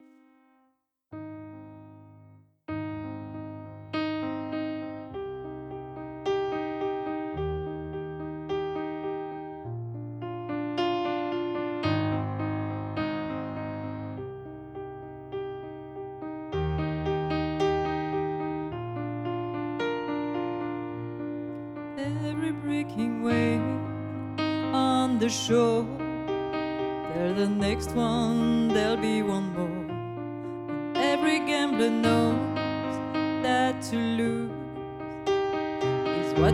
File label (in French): Duo Piano Voix